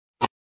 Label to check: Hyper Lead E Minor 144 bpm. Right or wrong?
Wrong